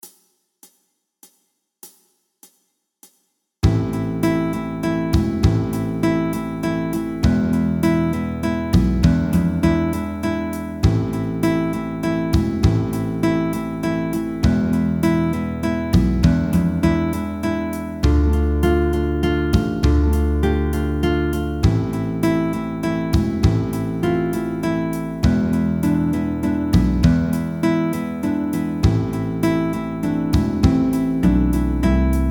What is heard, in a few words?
spanish dance, guitar